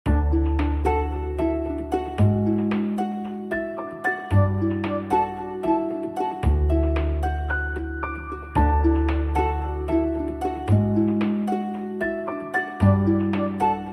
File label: Samsung Fallen Leaves